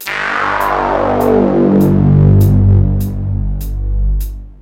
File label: Menu Click; ethnic cleansing 2002 gamerip